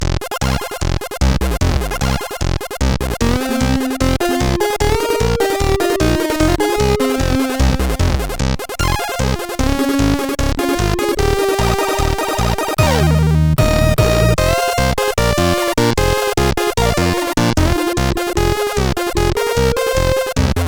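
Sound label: MOS 8580 SID chip music, games; CSG8580R5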